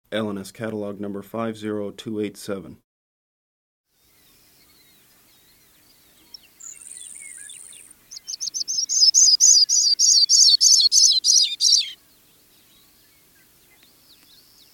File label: Spragues Pipit; Macaulay Library